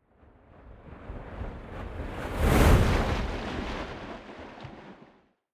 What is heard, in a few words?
waves surge long